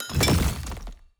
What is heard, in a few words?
successful coridor build